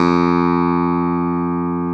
CLAV F2; Sonic Reality; Vintage Timetraveler; CLAV PIANET

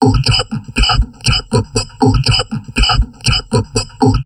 TALK 120, Drum Loops Crazy Processed

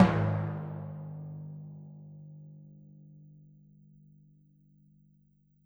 Timpani, Orchestral Percussion One Shots

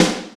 SPD-8, DIGITAL

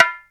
HI BONGO; E-MU Formula 4000 Series Vol. 1; Hip Hop Nation

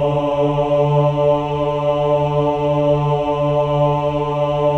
OH D3, CHOIR OHS; Spectrasonics; Symphony of Voices

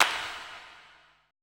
E CLAPS, ETH PERC

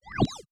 sine wobble, bass

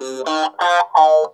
NOTE BOX, Funk Guitar; 90 s Sample CDs; Zero G

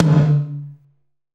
Tom, Gated Reverb; musicradar

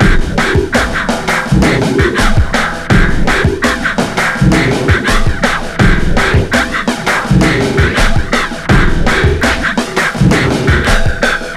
weird beat 83 bpm; Planet of the Breaks

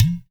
UDU; Spectrasonics; Heart of Africa

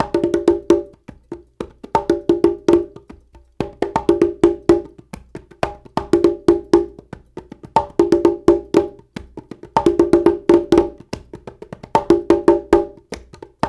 World Dance; Spectrasonics - Supreme Beats; Compasa

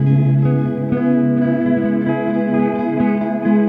Tapeloopgtr, Guitartronics; Sonic Foundry (Sony Creative Software); Ma Ja Le Ethereal Textures

